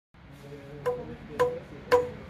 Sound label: tourniquet testing 7 half turns